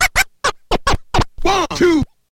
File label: scratch